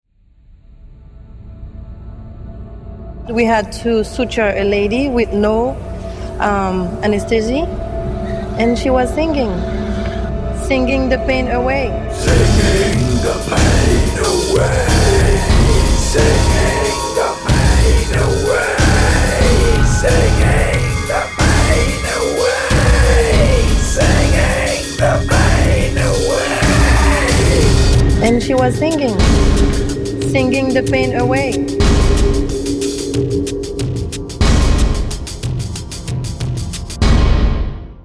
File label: Singing The Pain Away; Haiti